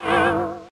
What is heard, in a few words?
Lick; pokemon reborn e19 2022